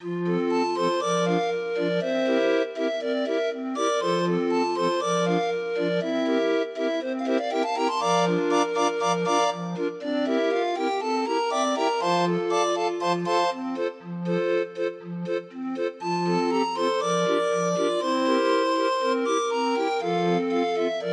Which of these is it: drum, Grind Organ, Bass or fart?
Grind Organ